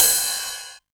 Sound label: 909 Ride, Drum Machines; Novation DrumstationV1